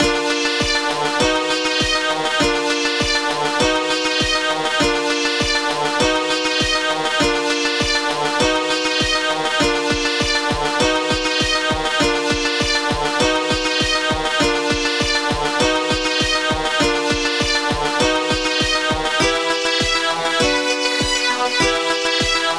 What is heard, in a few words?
dark pulse